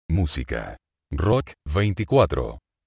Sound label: rock